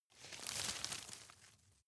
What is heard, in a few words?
rustle soft; berufe